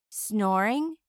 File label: snoring